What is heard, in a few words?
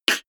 beatbox snare clap damped